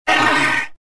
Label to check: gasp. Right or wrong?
Right